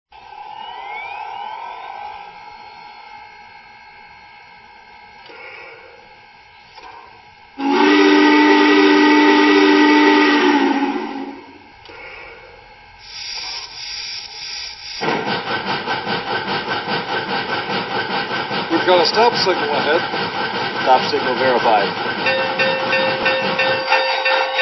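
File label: Challenger; OIL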